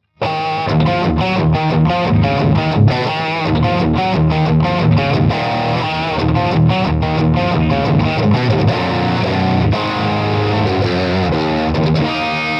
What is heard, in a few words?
marshall early 70s superlead full up